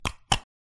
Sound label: button click; ion sound